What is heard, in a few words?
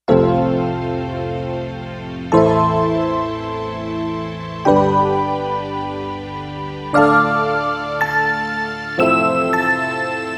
Fender Stack, synth